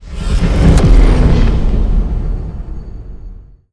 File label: sfx battleship moving out; Stellaris; nemesis